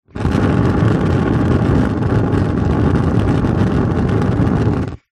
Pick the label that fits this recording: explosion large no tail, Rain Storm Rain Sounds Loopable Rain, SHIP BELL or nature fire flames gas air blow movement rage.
nature fire flames gas air blow movement rage